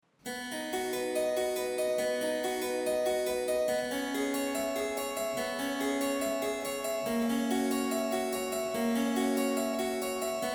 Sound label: C major; Bach